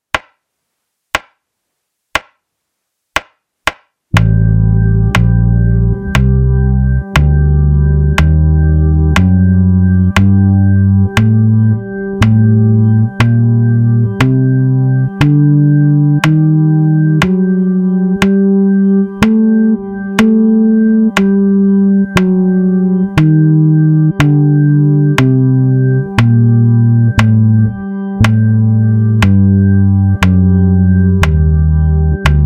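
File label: Locrian natural 6, bass; a bassists guide to modes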